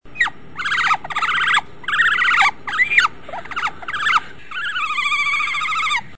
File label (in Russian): vydra golos